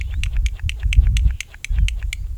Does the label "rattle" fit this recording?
Yes